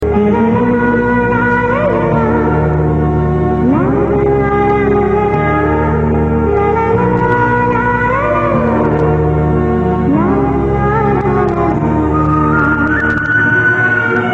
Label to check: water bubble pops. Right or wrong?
Wrong